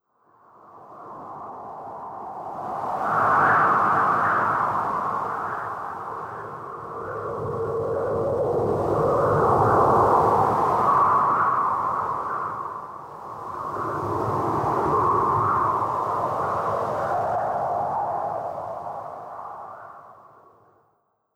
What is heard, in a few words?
Wind measure